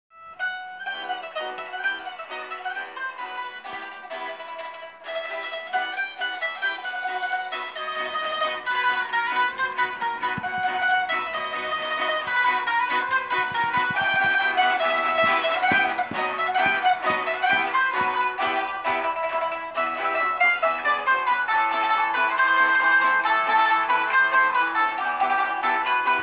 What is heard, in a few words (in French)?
Tarentelle corse